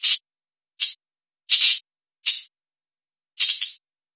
bug zapper loop; spacial loops

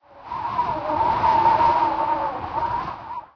wind snippet, ambient; Garry's Mod; hl2

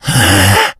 become alert, infected

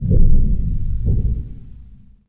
spaceship surrender; Sid Meier's Civilization 4 Complete; Beyond the Sword; Final Frontier; Units

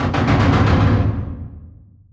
distance mechanized units mg fire; Hearts of Iron IV Death or Dishonor

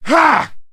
man ugh; Mount&Blade Warband

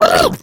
female boomer pain short; voice; Left 4 Dead 2

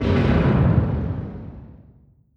distance airplane bomb; Hearts of Iron IV Death or Dishonor

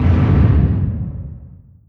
distance light cruiser attack; Hearts of Iron IV Death or Dishonor

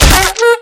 rifle swing hit; Left 4 Dead 2; clown

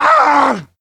man hit; Mount&Blade Warband

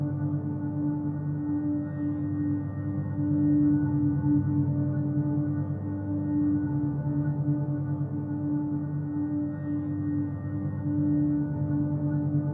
drone glassy; Left 4 Dead 2

